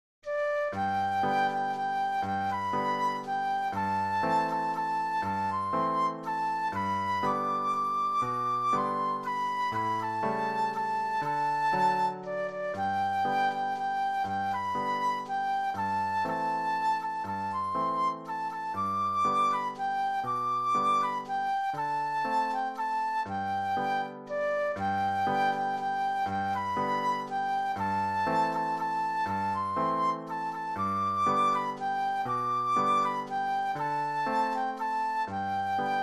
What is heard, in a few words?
Flute Solo